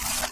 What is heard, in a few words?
ball out, modsuit